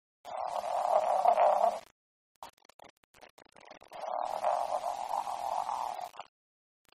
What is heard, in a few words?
Discoglossus pictus